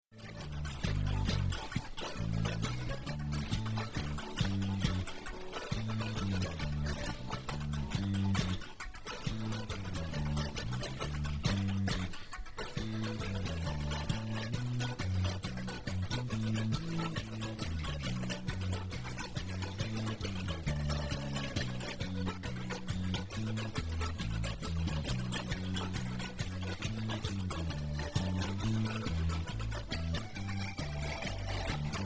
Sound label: Instrumental Irish Jigs; Tripping Upstairs; Rakes of Kildare